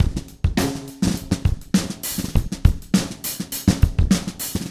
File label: Spungy Kit, Acoustic Drums & Percussion; Electronic; Sonic Foundry (Sony Creative Software); Electronic Point Blank